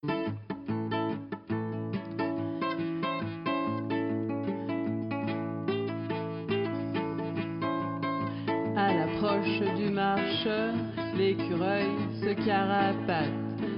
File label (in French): blues